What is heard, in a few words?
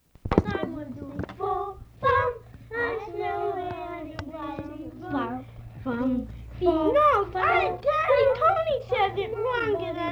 Boys Singing Assorted Songs